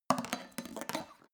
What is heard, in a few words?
small metal scrape bounce; industrie wirtschaft; metall